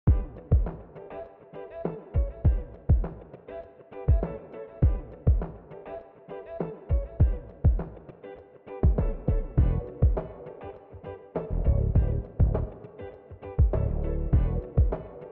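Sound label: ambience funk music; midnight guns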